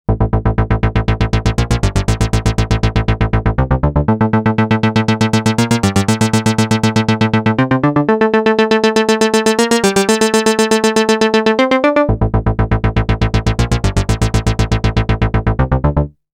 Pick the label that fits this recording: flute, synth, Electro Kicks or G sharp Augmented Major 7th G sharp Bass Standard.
synth